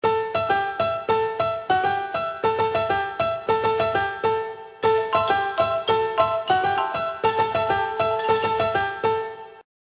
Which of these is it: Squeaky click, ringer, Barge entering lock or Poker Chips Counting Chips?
ringer